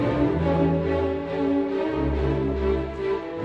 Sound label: 139 mozart loop, strings orchestral classical loops